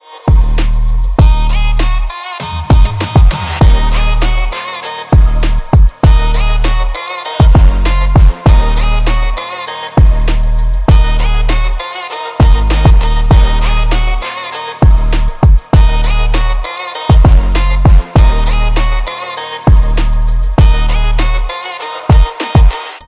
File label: 99BPM DMIN